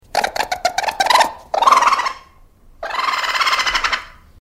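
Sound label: enot, raccoon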